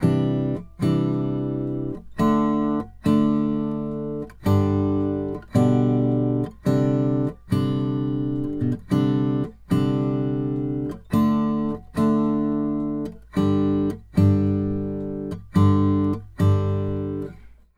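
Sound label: Country Guitar, Chorus 1; Digidesign; Pro Tools Essential Loops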